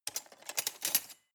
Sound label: cutlery large drawer rattle hard